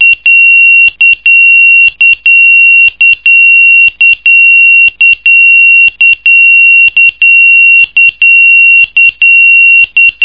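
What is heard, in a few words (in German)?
Piepser, Alarmtöne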